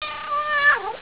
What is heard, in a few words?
meow